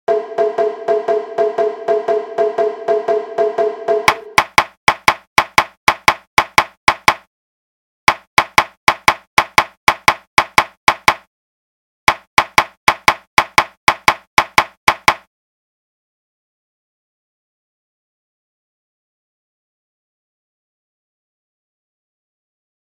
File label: hiryu sandan gaeshi group a group b shime 120bpm